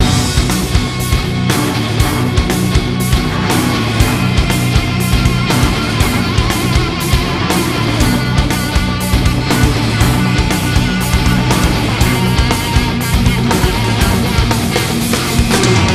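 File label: band loop; transformers war for cybertron gamerip; MATRIX OF LEAD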